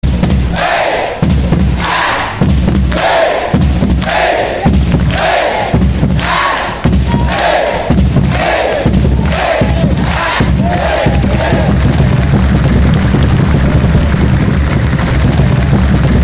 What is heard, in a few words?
claps